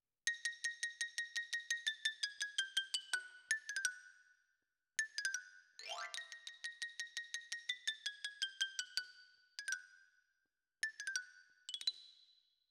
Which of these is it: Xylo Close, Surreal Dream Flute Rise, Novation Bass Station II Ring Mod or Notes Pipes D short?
Xylo Close